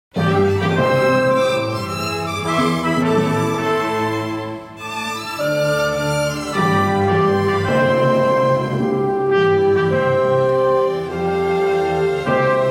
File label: Himno INSTRUMENTAL; PUCV